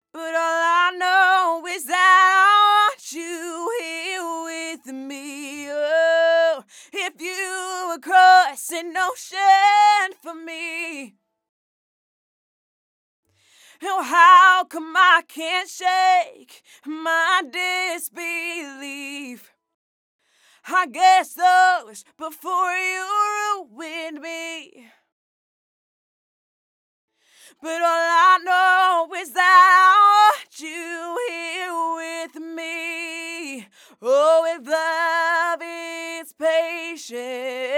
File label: Vocal Ambience Dry; Precedence